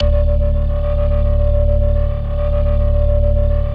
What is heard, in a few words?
ORG, Hip-Hop & R&B; USB Soundscan vol.47; AKAI